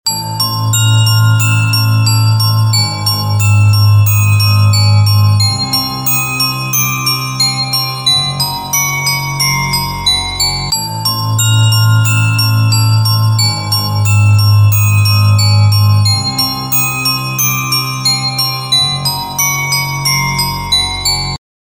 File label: Scary Bells Ringtone